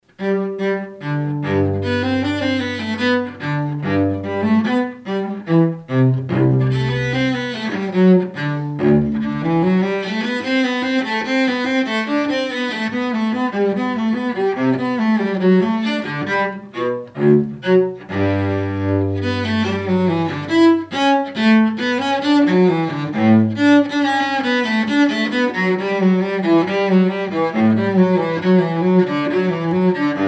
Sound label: Cello, Courante